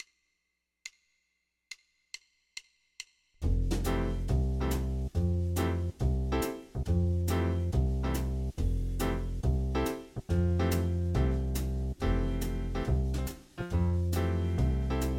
Fmaj7 1 Chord Groove; guitar; guitar lessons

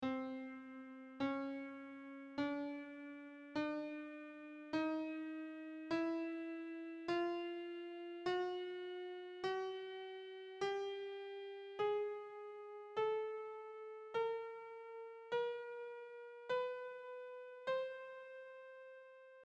15-tet scale on C

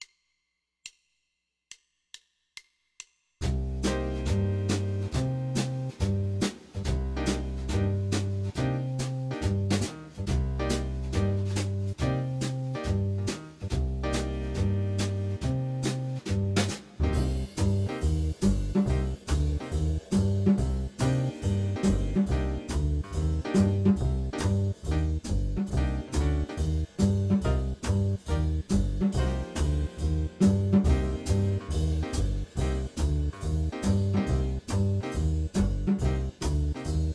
Cm7 1 Chord Groove; guitar; guitar lessons

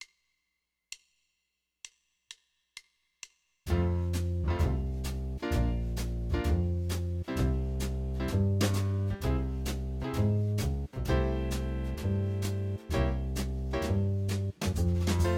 F Bb C Caug C6 C7 F; guitar

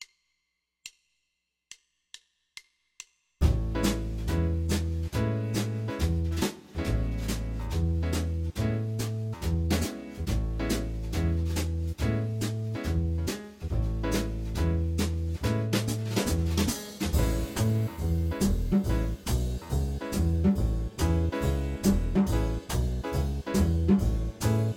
Amaj7 1 Chord Groove; guitar; guitar lessons

